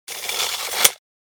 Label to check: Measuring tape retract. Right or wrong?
Right